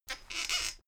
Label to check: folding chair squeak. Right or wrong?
Right